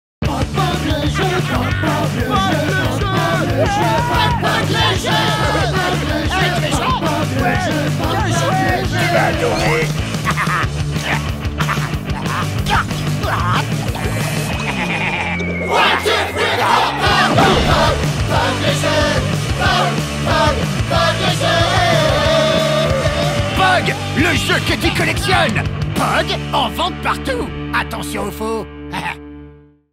Chant; POG Le Jeu

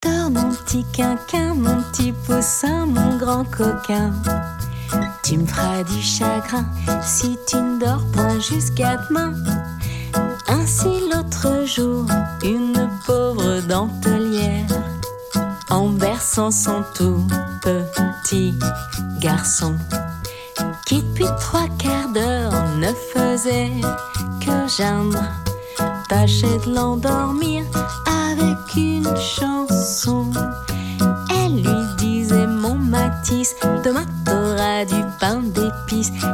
Extrait chant Dors mon p'tit quinquin

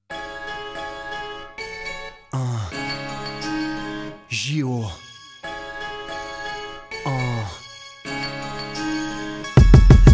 Chant Bus; Bus des JO

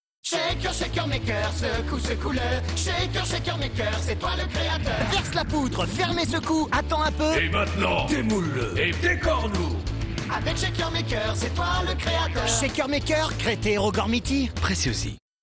Chant

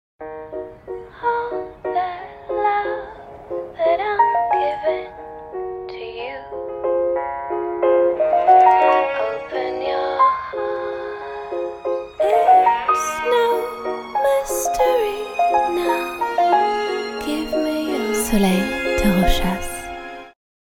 Chant Signature; Soleil de Rochas

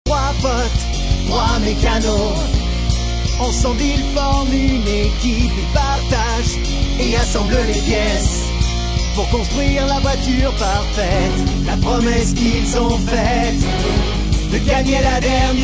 Chant; Klik Karz

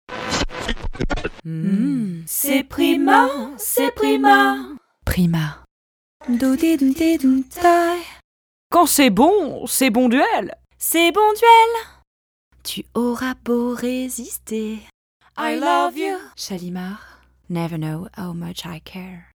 extrait pubs chantées

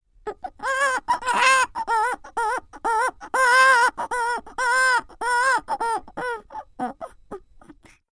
Pleurs du nouveau né doublage film

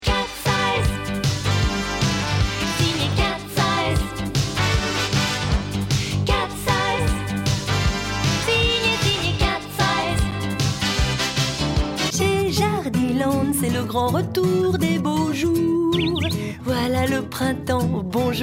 Montage chant

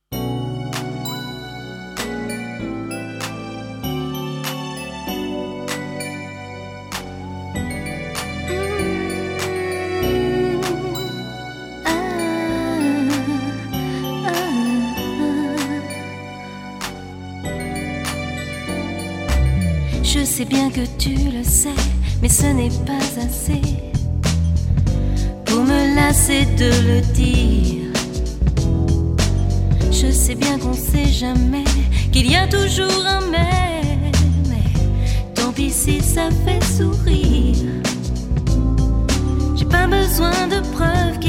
chant

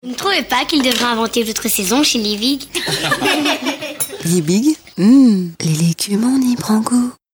voix chantee; voix-off

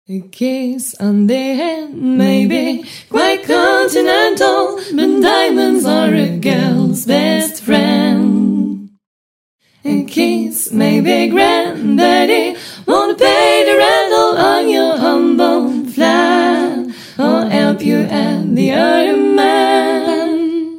Chant

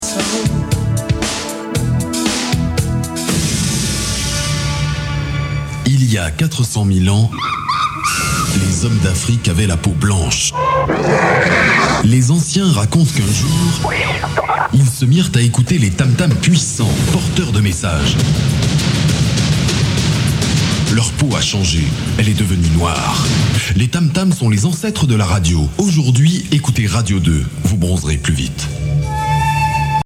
Tam tam

